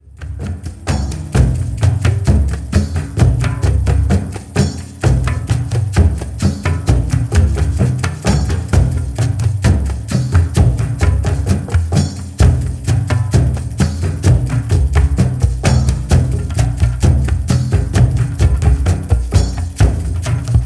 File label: relax cargo drum; powermusic